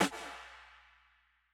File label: Gaze Snare